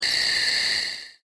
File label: night